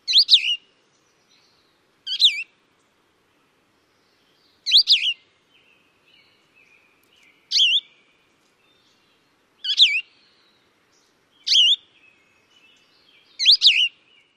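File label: song, Cassin's Vireo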